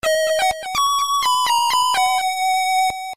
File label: ball chime; Undertale